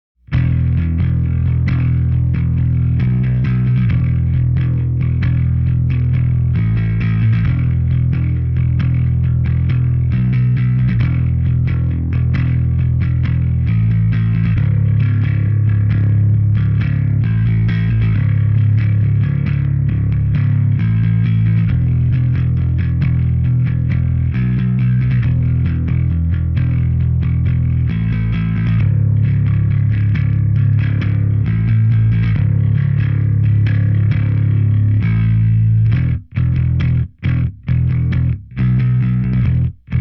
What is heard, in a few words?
bass